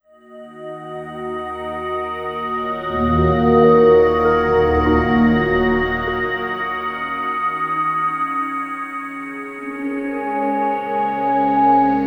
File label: Melodic Chords; Sonic Foundry (Sony Creative Software); Slow Silhouette